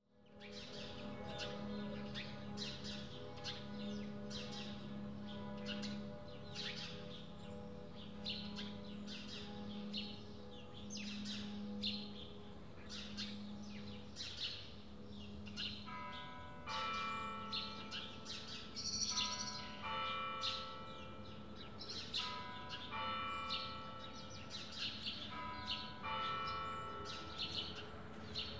kirchenglocken am sonntag morgen um acht uhr; geraeusche digital

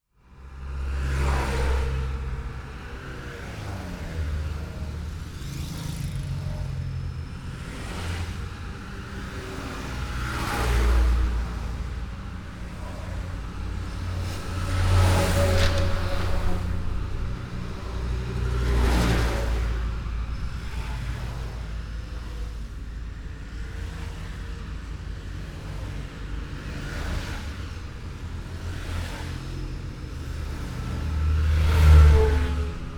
strassenverkehr auf der ausfallstrasse; geraeusche digital

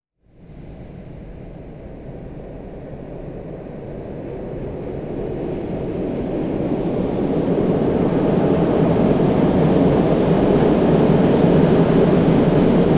zug faehrt ueber viadukt; geraeusche digital

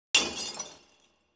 zersplitterndes glas; geraeusche digital

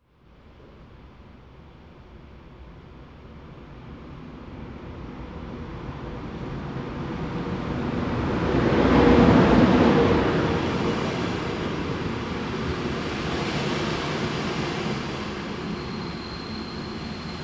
zughalt und weiterfahrt; geraeusche digital

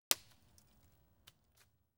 knackender zweig; geraeusche digital

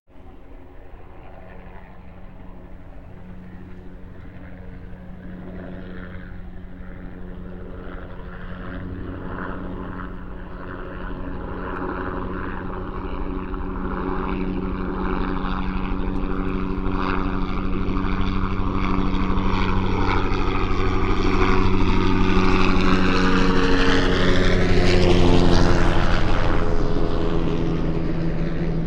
startender hubschrauber; geraeusche digital

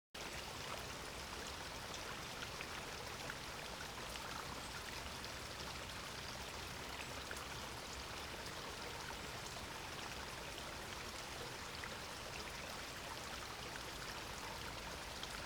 bachgeriesel ohne vogelgesang; geraeusche digital

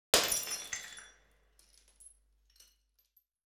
flasche wird mit hammer zerschlagen; geraeusche digital

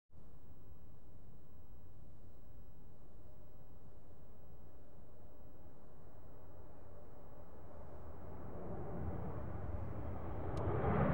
startendes duesenflugzeug; geraeusche digital

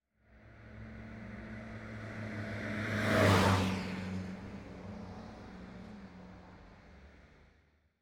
schnellfahrender pkw; geraeusche digital

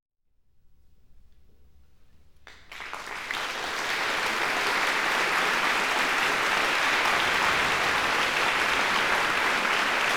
beifall; geraeusche digital

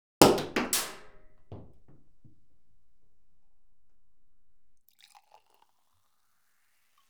knallender sektkorken mit anschliesendem einschenken; geraeusche digital

